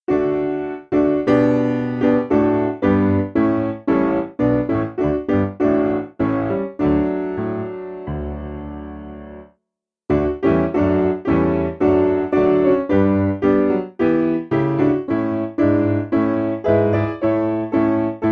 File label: S Piano